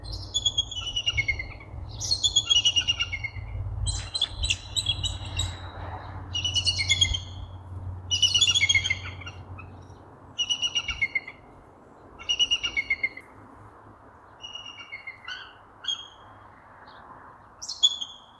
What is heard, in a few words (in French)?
Pygargue a tete blanche; Anthony Gliozzo